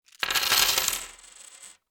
coins to table

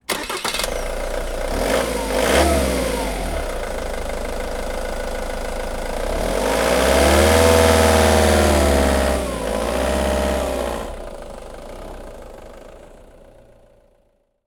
van start and reverse away; transportation

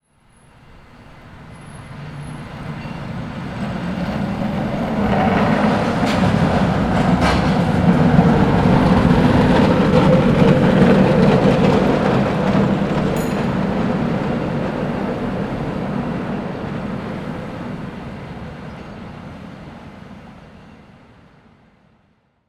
cable car pass by; transportation

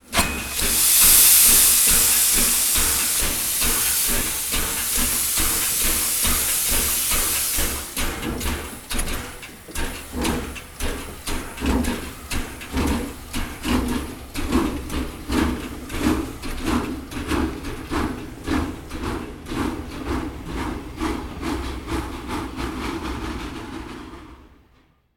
steam locomotive depart; transportation

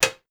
button

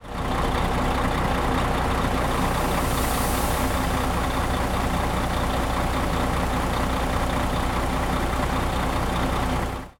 truck idling; transportation

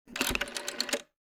rotary phone

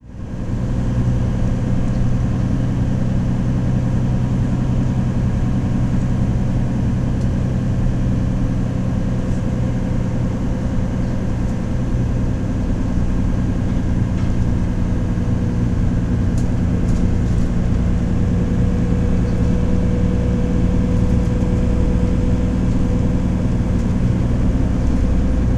bus driving interior ambience; transportation

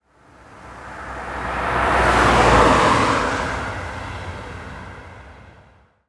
van pass by; transportation

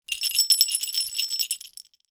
small bell ringing

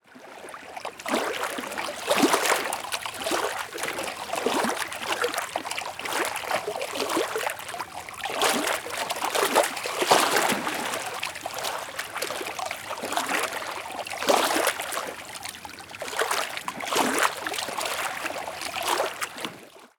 lake waves lapping on the shore; nature

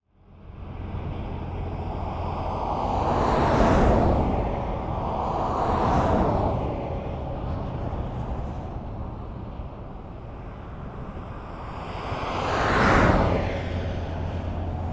highway; transportation

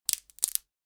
wood cracking; nature

